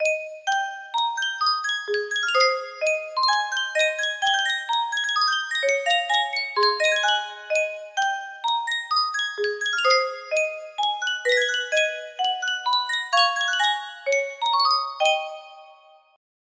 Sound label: music box